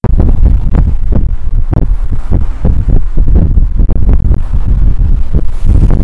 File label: wind